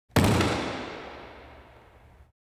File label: body falls onto; Parkour; Butterfinger Video Sound Effects; Additional Parkour Sounds